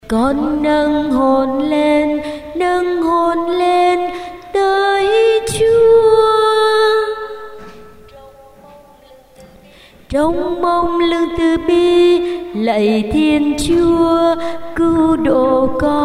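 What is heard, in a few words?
soprano tenor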